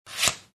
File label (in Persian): صدای قیچی